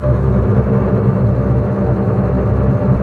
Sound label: STR DB TRM, Symphony Orchestra, STR Cbs Tremolo; Roland LCDP08 Symphony Orchestra; STR Cbs Bow FX